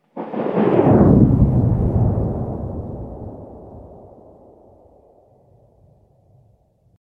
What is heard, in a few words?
thick space whoosh; overte; partyBall